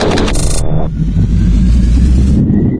thumper startup, machines; Garry's Mod; hl2

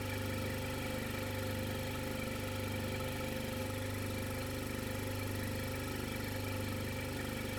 compressor; ambience; AssaultCube

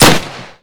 PPSH Shoot; Minecraft; Infamy; Last Alive Pack

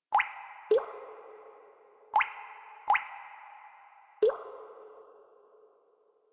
cave drip; ambience; AssaultCube

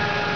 turret loop; Emperor - Battle for Dune

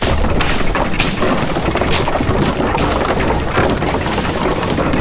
Trebuchet Run Leaves; Sid Meier's Civilization 4 Complete; Warlords; Units

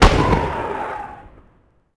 Rocket Shoot; Sid Meier's Civilization 4 Complete; Warlords; Units

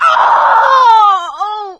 hurt critical; Left 4 Dead 2; producer

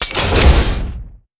contract seal; Counter-Strike Global Offensive

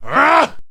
man grunt; Mount&Blade Warband

